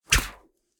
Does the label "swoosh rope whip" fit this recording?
Yes